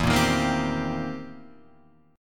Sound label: C Minor 7th E; Cm7/E strummed